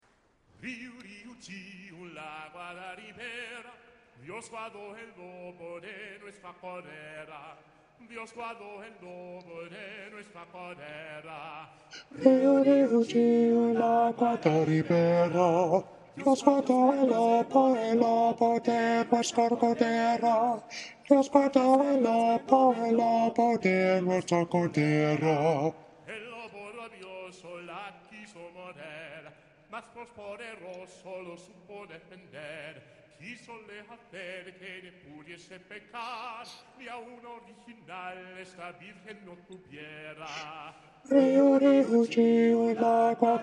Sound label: bass; Riu, Riu Chiu. Bass 1; Choir Parts, Palo Alto Christmas 2013